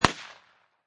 Pistol Remington Gp 38 Single Shot Exterior At 45'; Gun / Pistol; at 45 feet distance